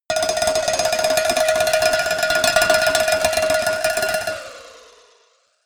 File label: cowbell tremolo move